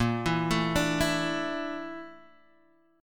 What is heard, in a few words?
A sharp Major 7th Flat 5th, Guitar Drop D